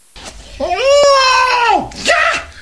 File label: hoogaah